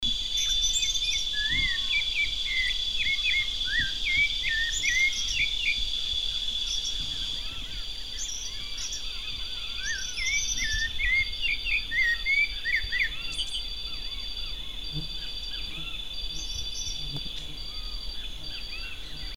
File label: Turdus rufiventris; PE Intervales; SP